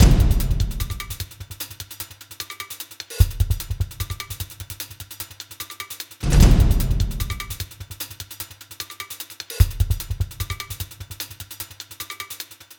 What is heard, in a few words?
Kit Loop